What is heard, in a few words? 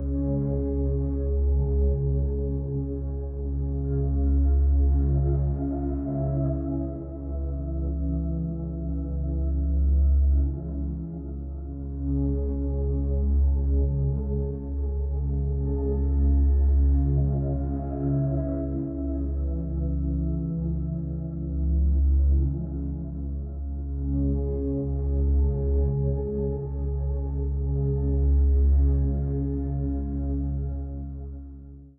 under the dim sea, ambient, BPM 42 / Key F; TinyBGM